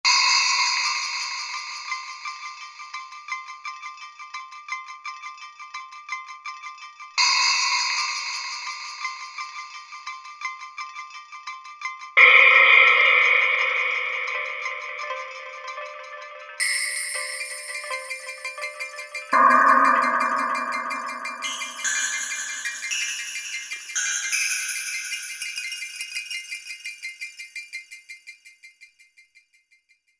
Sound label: Space Perc.